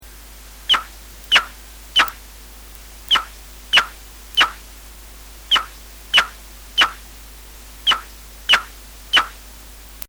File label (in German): Abendsegler Balzruf Heterodyn